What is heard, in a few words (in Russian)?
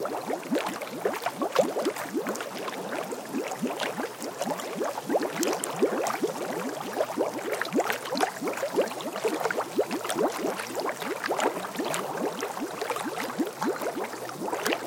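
бурление морских волн смывающих песок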